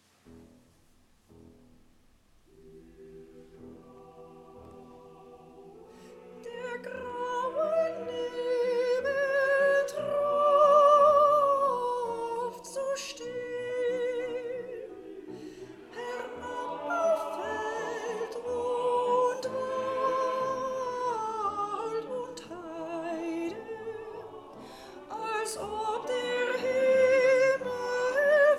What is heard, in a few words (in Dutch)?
SOPRAAN; Spatherbst Brahms Op 92, no 2